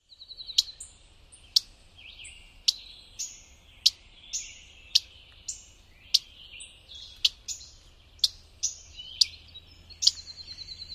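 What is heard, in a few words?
Mourning Warbler, female alarm calls; NY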